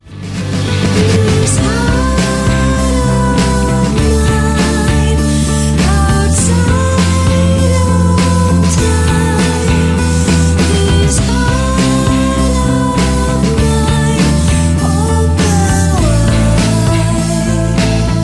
ROCK

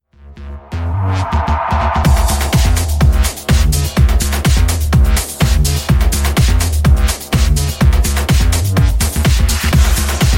deephouse sample

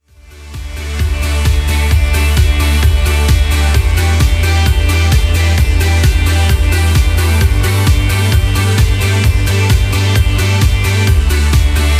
MUSIC POP

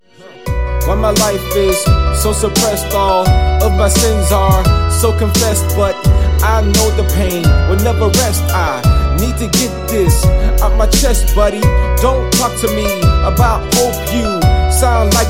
HIPHOPRAP

